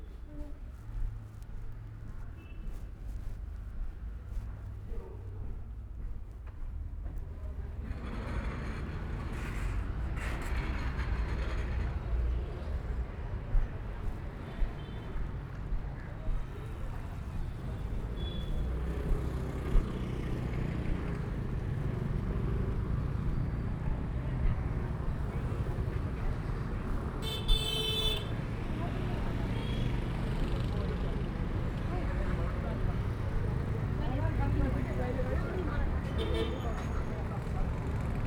Boudha Road To The Laundry, Boudha, Kathmandu, Nepal, fieldrecording